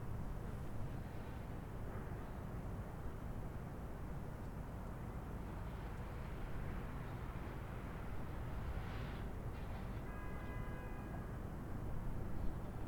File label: Outdoor Ambience City Quiet Distant Birds Traffic